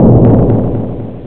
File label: Grail Power; Crystal Caliburn™ DEMO